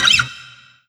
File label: Roland SC-88 Fret Noise